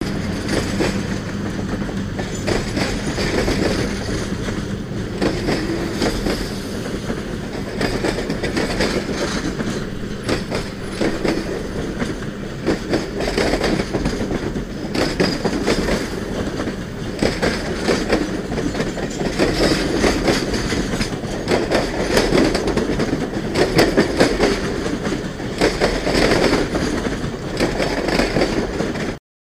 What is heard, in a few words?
Track Clacks Box Cars, bys